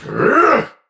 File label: Pain Heavy; Tau; Dax; Battle Barks